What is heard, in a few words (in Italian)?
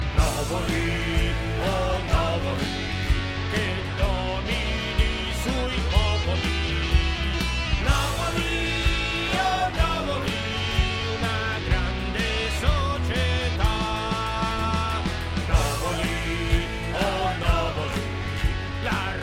Inno Ringtone; Novoli